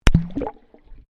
Water Microphone Dunk Shallow Submerged

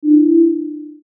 Beep Tone

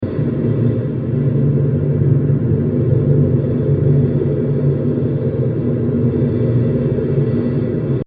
Space Ambience 120bpm; Sound Design

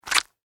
Horror Squish; Monster Sound Effects; Monsters (Sound Effects:Horror)

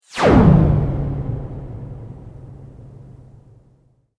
Sweep Mechanical Medium

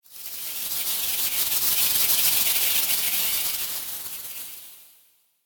Evil Spirits Idle Chatter

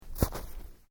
Footsteps Boots Snow Shallow Step; Footstep Foley; Human

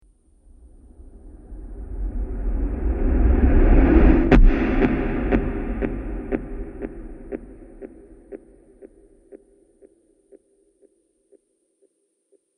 Movie Trailer Slow Rising Impact Delay

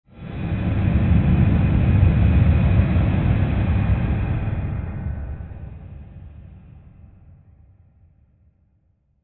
Swell Airy Rush Rumble Long; Sound Design; Swells

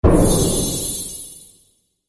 Pantomime Appear Sparkle; Cartoon Sound Design Sound Effects

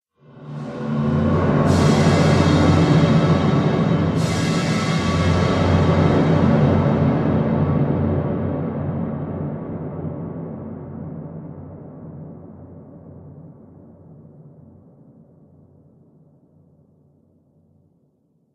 Drums Cinematic Stinger; Sonic Logo Sound Effects

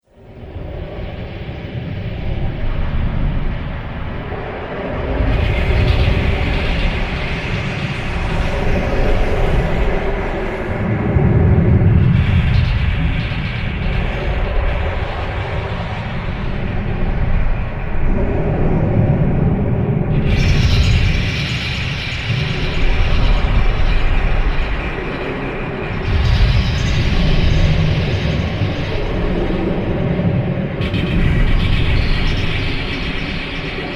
Drone Kingdom Of Lava, drone sound effects

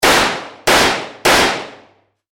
warfare gunshot x3 range, Handgun Gunshots X 3; McKinney Sound

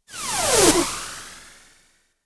Missile ricochets left to right; Cannon; BBC

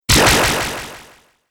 Laser Zap, Laser Gun; Laser Gun Sound Effects

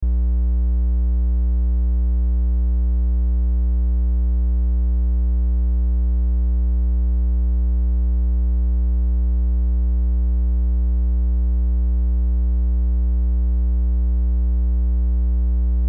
Hum Buzz 60 Cycle; Technology; Blastwave

